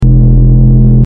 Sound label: Synthwave hum